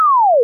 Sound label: string